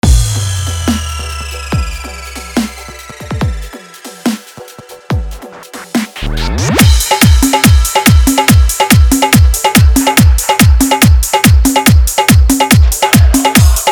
Old Bhojpuri Dhollki Bass Dance Mix; Rod Dance Mix

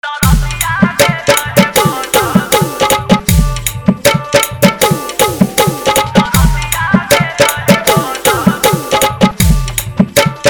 Bhojpuri

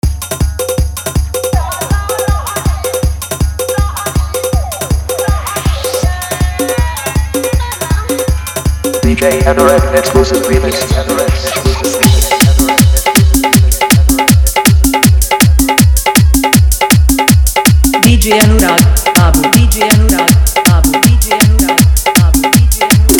Fully Dhollki Bass Dance Remix

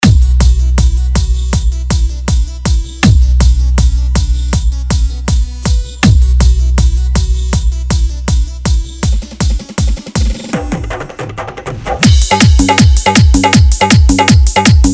Hard Dhollki Bass Mix

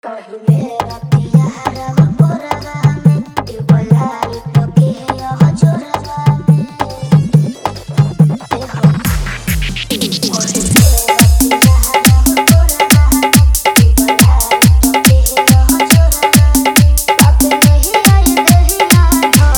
Bhojpuri Dj Song Hard Dhollki Bass Remix

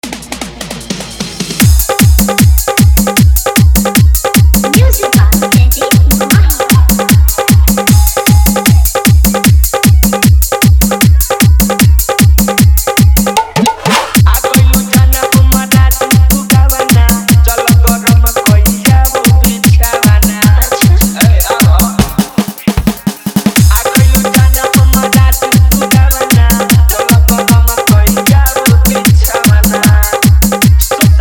Bhojpuri Dj Song Hard Dhollki Bass Mix

